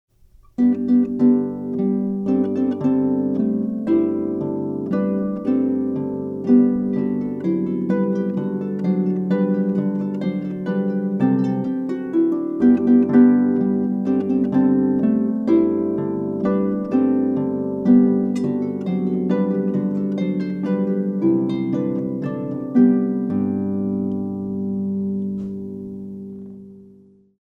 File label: Minuet